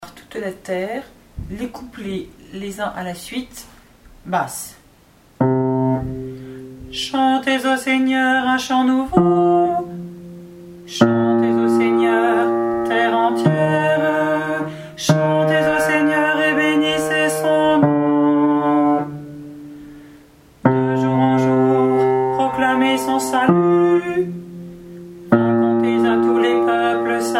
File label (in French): BASSE; Allez par toute la terre COUPLETS